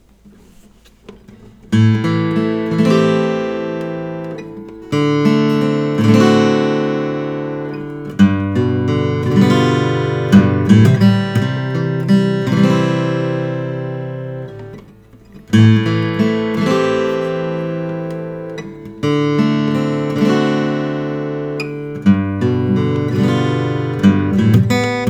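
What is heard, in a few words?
martin custom 00 14 slope short, guitars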